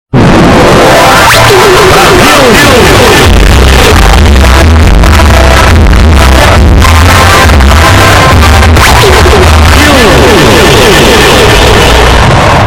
brasil jingle goal

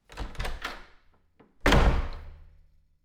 wood door

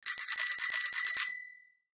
ring

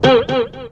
twang; formation

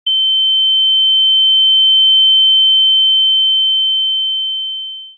ears ringing

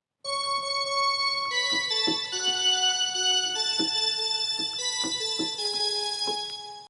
piano

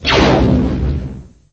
fire sound; lando; lego star wars

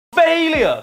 failure

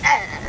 "eughhh"

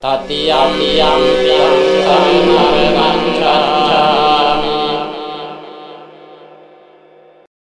chants; eternal darkness

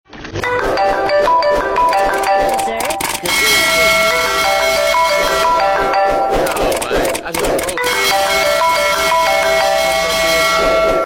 goofy ah ahs

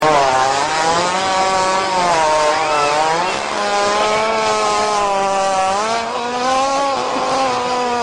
fart

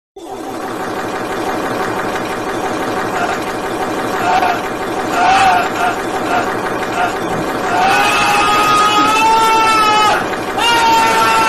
fart chopper

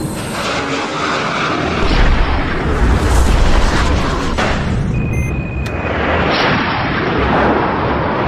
jet; firefox

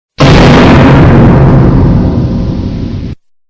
scary sound effect; goanimate

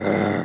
growl; talking ben

